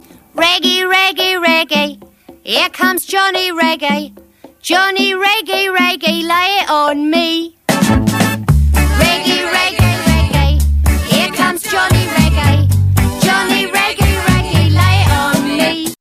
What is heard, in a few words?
reggae